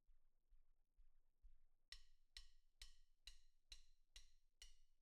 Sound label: Snare Bot